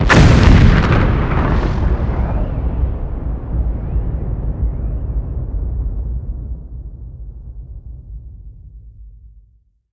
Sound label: explo; Mafie 1